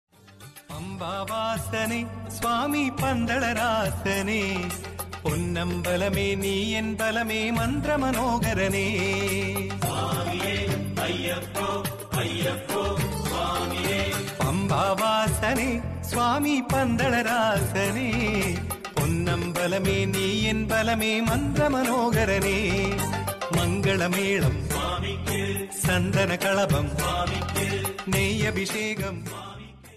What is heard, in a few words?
ayyappan cut songs tamil ringtones